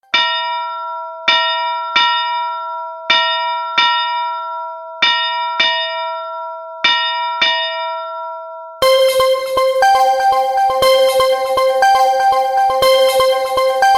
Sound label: bells; Lumia